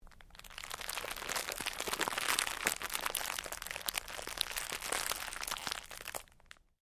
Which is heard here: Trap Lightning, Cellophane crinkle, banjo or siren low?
Cellophane crinkle